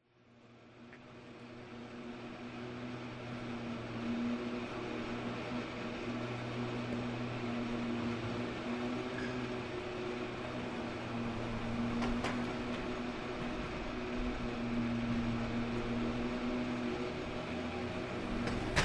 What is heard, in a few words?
cable car cable hyde and washington; white noise lounge